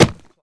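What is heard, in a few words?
Hit Soft Single, cloth movement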